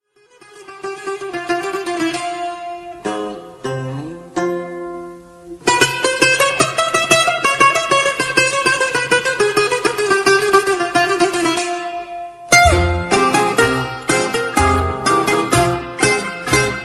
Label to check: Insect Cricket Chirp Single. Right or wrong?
Wrong